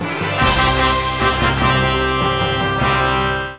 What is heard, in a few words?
victory; Free Hearts; Gambling